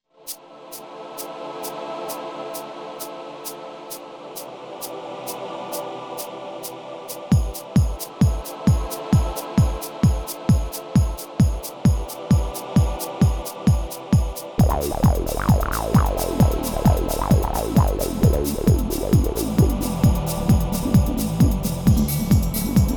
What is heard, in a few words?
trance